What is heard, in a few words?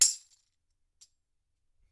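TAMBOURINE DRY; InVision Interactive; Percussion Slam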